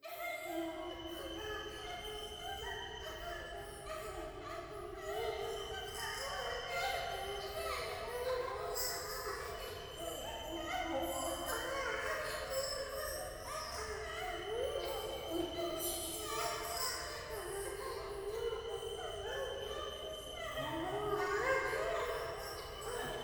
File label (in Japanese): 風呂 喘ぎ 山奥